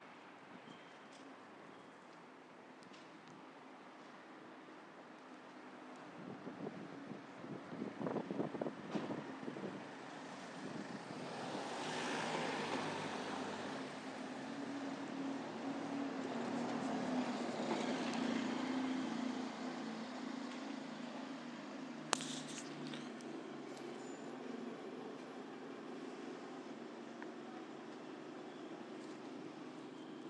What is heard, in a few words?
ambience aboard a bus to vauxhall including the driver; driver dangerously failing to warn me